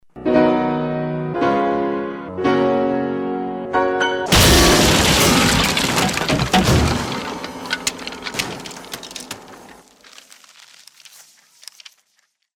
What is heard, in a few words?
Piano Smash; Audio Movers; one of a kind piano